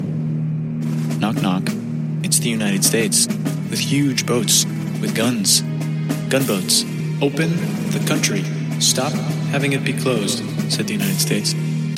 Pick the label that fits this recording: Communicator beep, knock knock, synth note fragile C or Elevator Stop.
knock knock